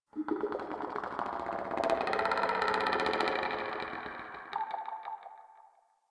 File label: Chatter Scream; Ambience; Misc Outdoor; Warframe; Infested Eris